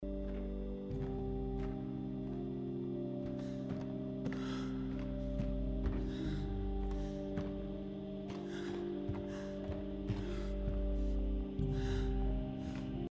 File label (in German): laufen